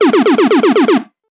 doot doot doot doot doot doot doot, Zaps and Beeps